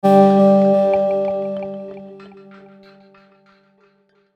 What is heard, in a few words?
Logo Swell Pan Delay; Sonic Logo; Blastwave